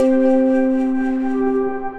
Heavenly Plucks; Ableton; Mood Reel; Placid Plucks

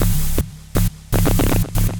Fuzzer Bass, synth; Ableton; Factory Packs; The Forge by Hecq

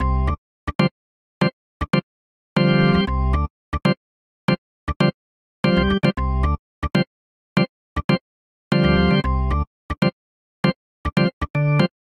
Vamp Dub Organ D Dorian 78 bpm; Electric Keyboards; Ableton; Factory Packs

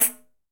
Pandeiro Shake; Latin Percussion; Drums; Drum Hits; Tambourin; Ableton; Factory Packs

